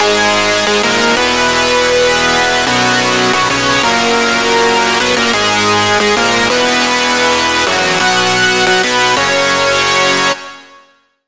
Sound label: Lead; Routed Sound; Sylenth1 Expansion - Star Scavenger Vol.1